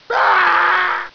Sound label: death; cstrike; codlife